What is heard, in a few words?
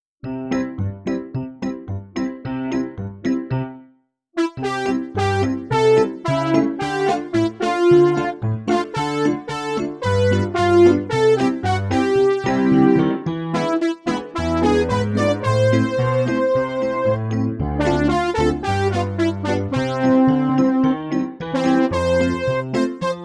karaoke